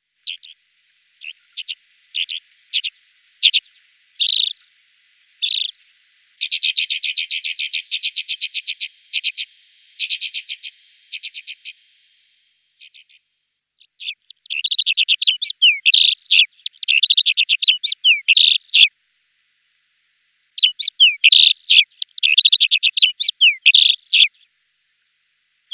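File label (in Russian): Zavirushka alpiyskaya, alpine accentor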